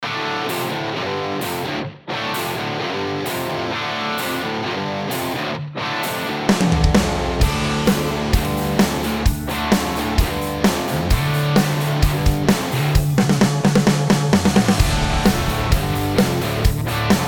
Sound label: Ludwig 5x14 Black Beauty Snare Drum Open